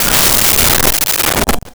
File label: Space Ship Fly By, Science Fiction